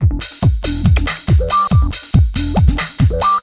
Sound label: DRUM, HOUS-TEC DRUMS; MAGIX